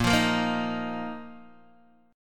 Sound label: A sharp Suspended 4th A sharp down Guitar DADGAD